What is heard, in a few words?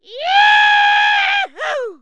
Cowboy Yahoo!, Cartoon Yells